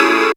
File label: Trancers, Dance Synths; Trancers 2